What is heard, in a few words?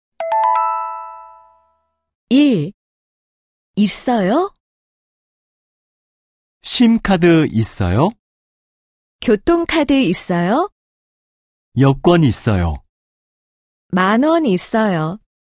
K-POP